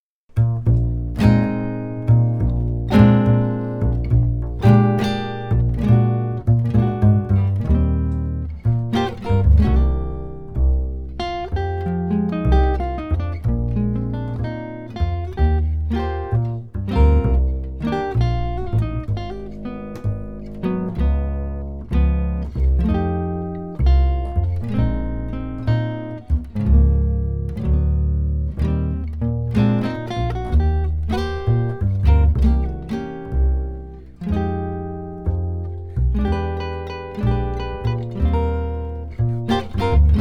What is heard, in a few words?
Duo with Bass